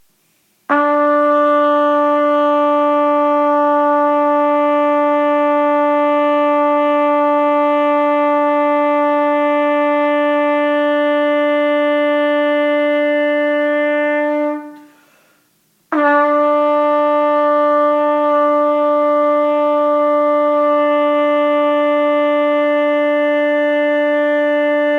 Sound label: Long Tones Low E, trumpet